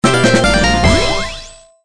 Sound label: Doll Fanfare